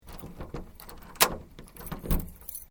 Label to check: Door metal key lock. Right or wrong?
Right